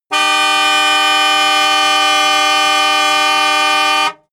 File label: car jaguar e-type honk long close